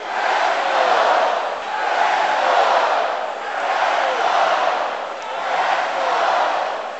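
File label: Basketball Crowd Chant Loop; Crowds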